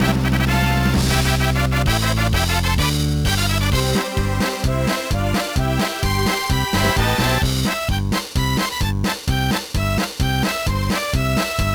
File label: BGM